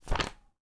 Page Turn

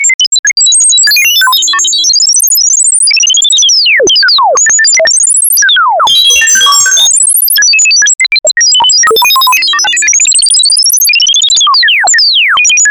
Random Sine Bleeps 1960 S Sci Fi

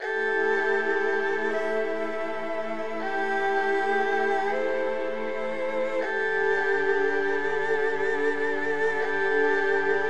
Erhu Melodic Loop

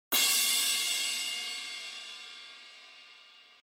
Crash

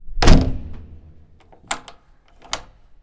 Door

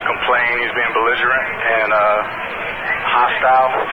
Police Fire Ems Random

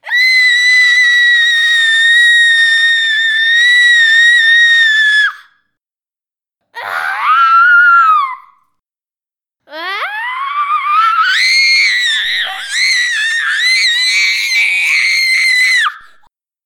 Scream Little Boy

